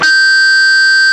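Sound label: Guitar Harmonics B2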